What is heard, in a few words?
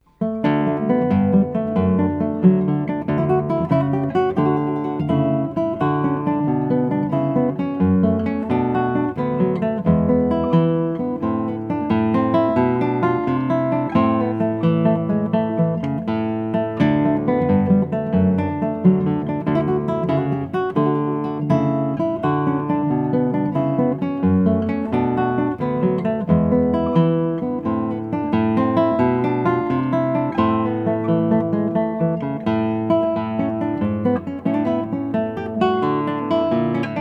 Cello Suite No. 1; BWV 1007